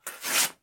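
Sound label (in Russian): paper tearing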